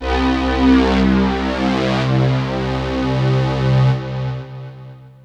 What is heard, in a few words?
Synth hits and riffs